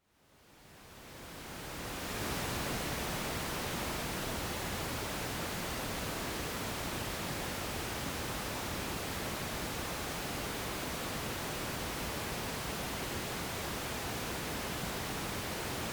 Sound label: ventrose noise